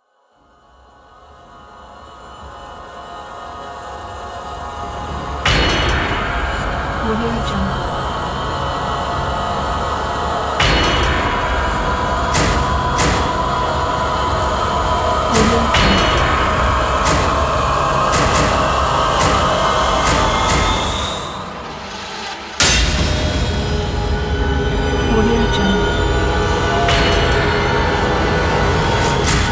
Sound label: horror